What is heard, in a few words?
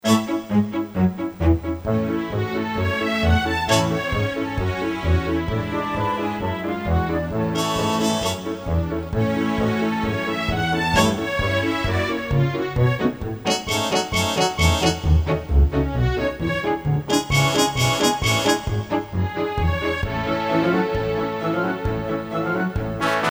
accomp only